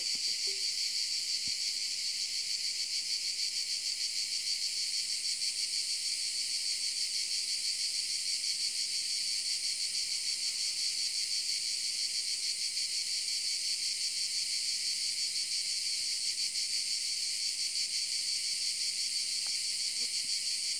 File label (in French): Ambiance sonore Cigales